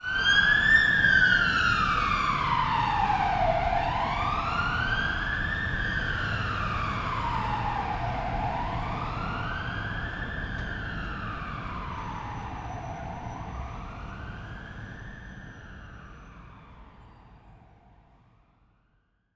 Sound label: police car